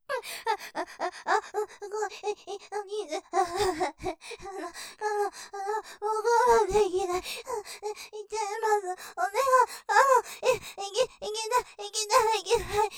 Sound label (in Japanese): 喘ぎ; すごい；もうがまんできない；；イッちゃいますぅ；; ループで楽しむ！シェフの気まぐれ抜き抜きコース